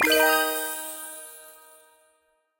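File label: red envelope spawn; angpao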